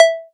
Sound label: Synth Cuts; Vengeance Samples; VES1 Instruments